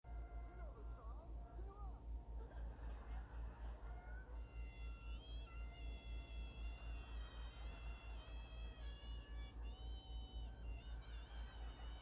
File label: Bagpipe; Friends